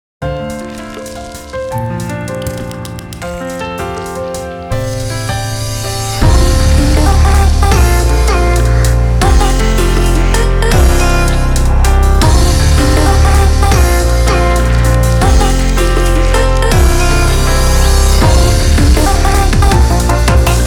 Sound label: Electro 160